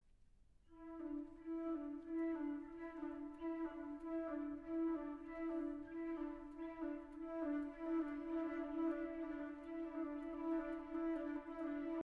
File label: Clocks And Clouds; Ligeti